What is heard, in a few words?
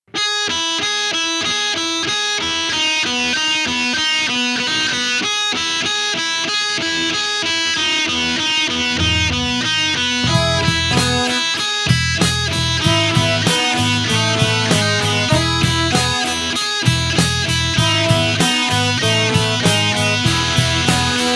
Instrumental